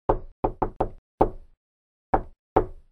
WOOD

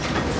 Laser Lab Sound; Zap!; EdMark Corporation

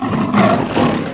growling

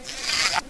Grow Sound; AmoebArena Demo

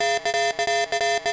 proximity

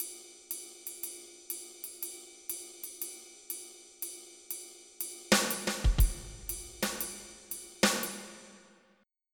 SWING

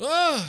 FALL

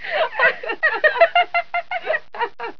Giggles; Professor Multimedia

